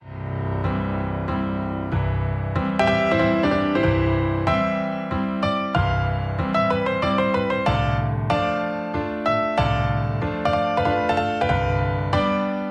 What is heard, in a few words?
Piano Concerto; Tchaikovsky